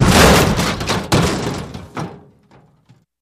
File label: Metal Impact Collis